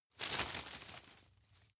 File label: grab crunch medium